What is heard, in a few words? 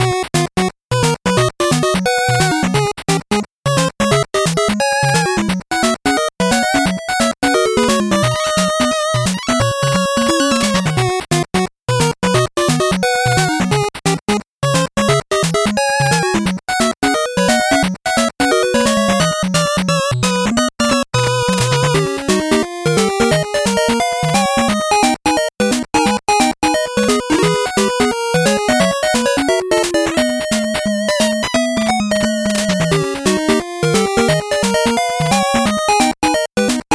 8bit bgm normal